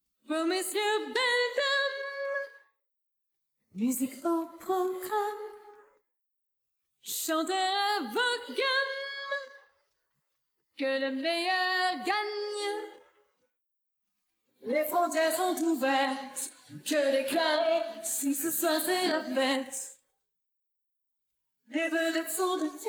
extract voix; unseelie

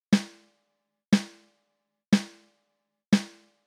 caisse claire apres egalisation

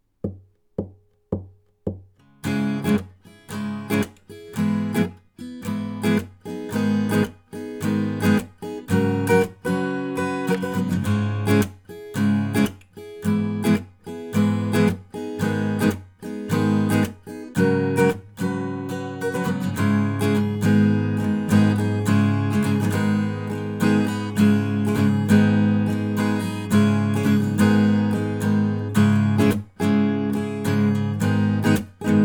guitare strum; lct 140 air

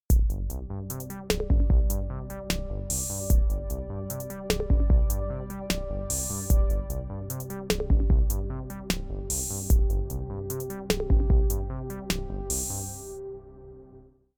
reels sans; audiothing

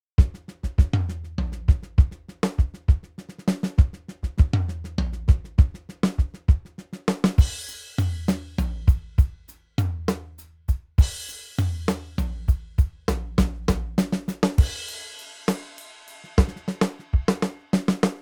batterie avec

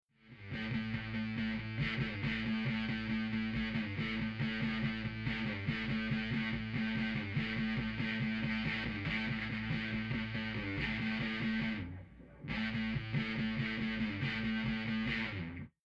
guitares sans; bxconsoleE

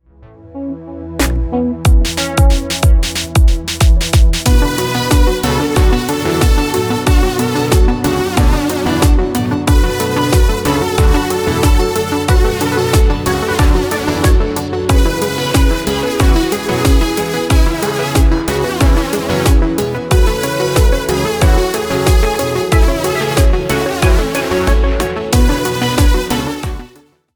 mixdown avec fabfilter pro l2 safe

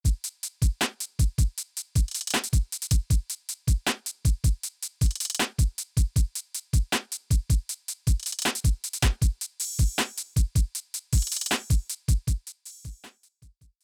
beat avec saturation

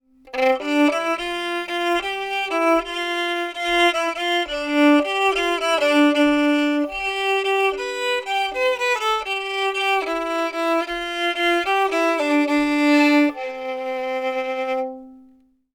violon normal

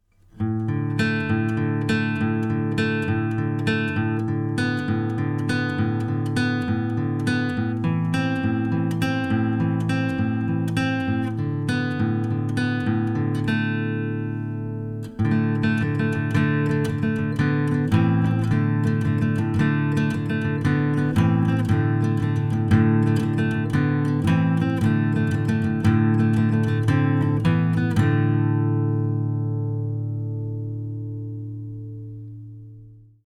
guitare acoustique sans; overloud eq84

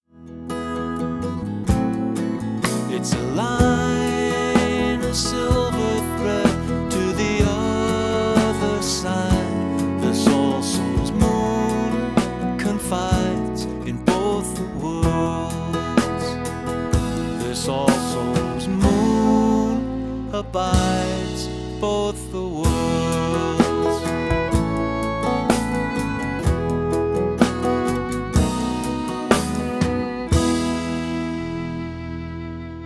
mixage cymbales fullmix avec effet

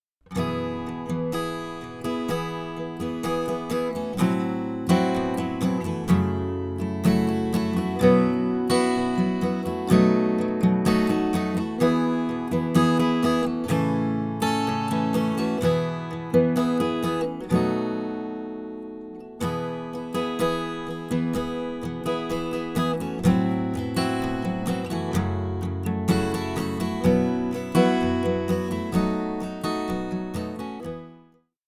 guitare sans effet; overloud otd 2

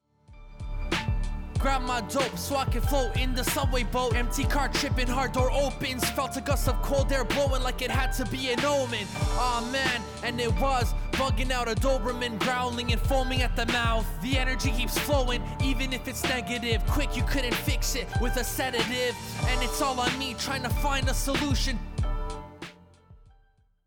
voix avec effet; FETpressor

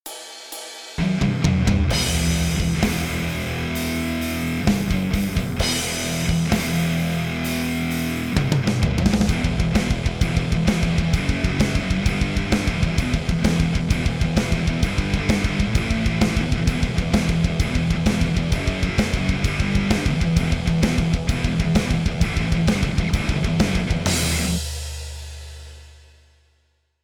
heavypedal guitare mix

